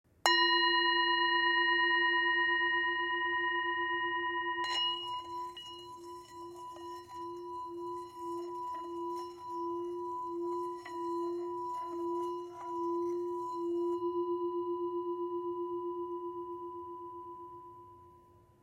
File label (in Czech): tibetska misa; chu mala